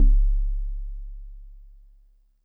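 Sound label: Warble Celeste DX100 C#2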